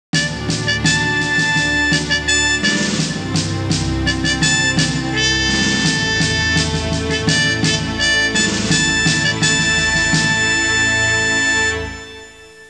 musica sinfonica; symphonic

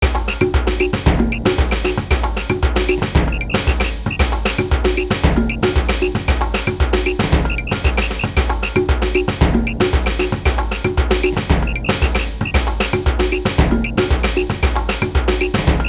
suonerie musica ritmica; rhythm

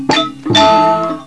suonerie ambiente

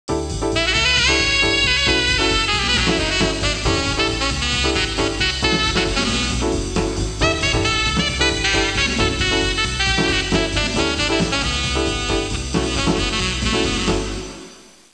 suonerie musica jazz

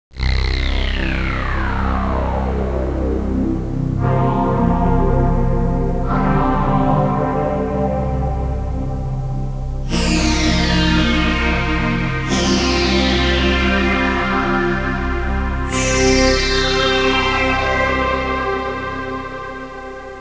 musica tecno; techno

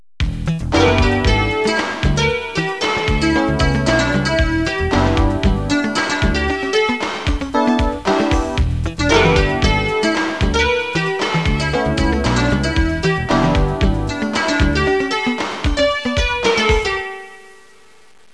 suonerie businessy

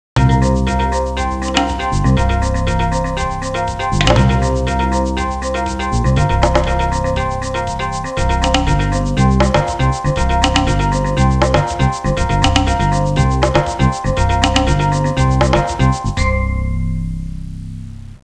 suonerie musica ritmica

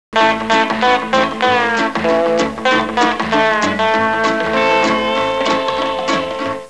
musica strumentale

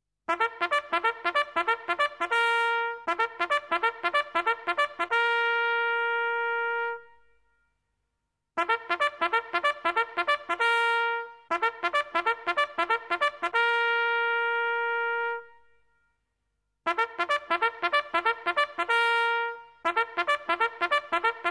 suonerie tromba militare

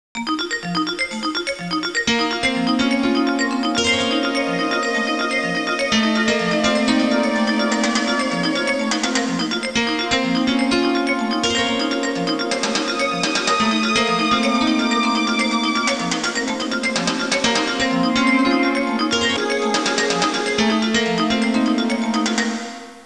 musica elettronica

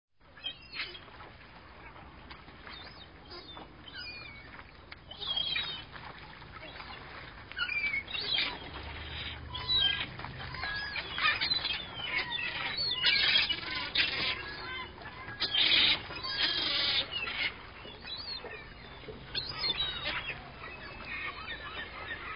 uccelli birds, animali

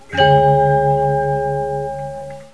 suonerie ambiente

